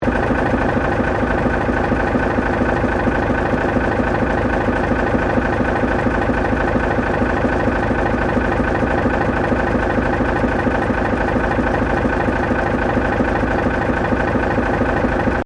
Train Diesel Railcar DP50 1950 Tasmania Idling Close Loop